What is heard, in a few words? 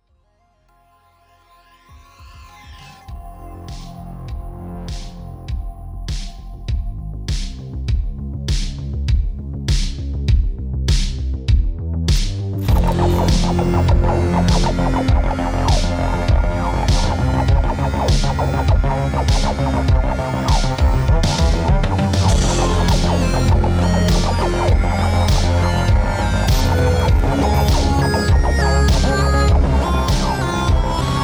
Synthwave